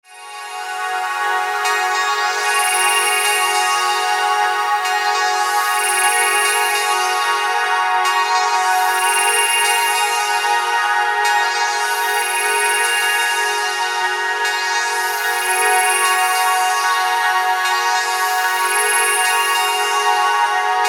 Bed Vocal Pad Synth Blips, Bed Shimmer Ring Chopper; Bed Sound Effects; Blastwave; Production Element Imaging Element Accent Transition